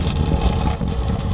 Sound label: CRATE; KINGPIN